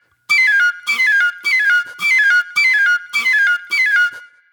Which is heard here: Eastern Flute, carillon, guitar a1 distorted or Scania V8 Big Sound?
Eastern Flute